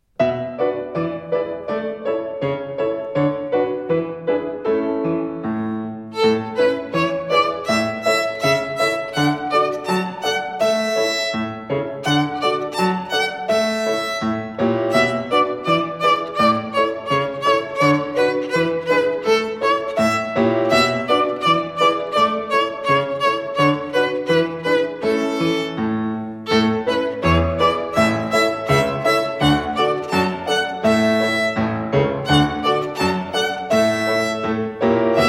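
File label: Song of the Wind in Performace Tempo, Violin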